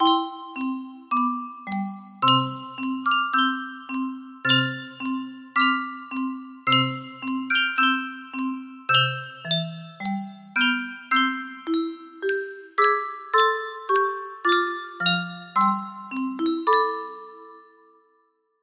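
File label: music box melody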